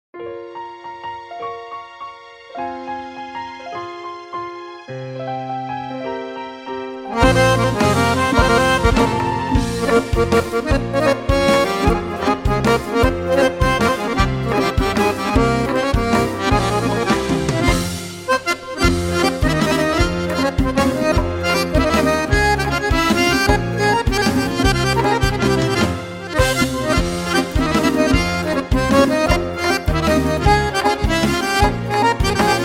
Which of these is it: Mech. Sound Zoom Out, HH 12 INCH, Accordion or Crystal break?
Accordion